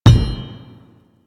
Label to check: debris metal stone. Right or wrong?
Right